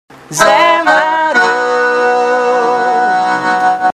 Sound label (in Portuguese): Vinheta cantada